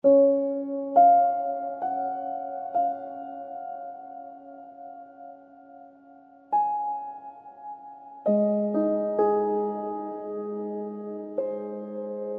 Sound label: Cinematic Piano; Storytelling Essentials